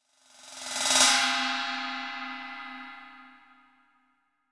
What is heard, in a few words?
ride